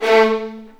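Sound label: A3 violins; The Ultimate String Collection; East West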